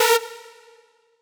synth

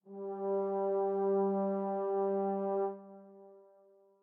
strings

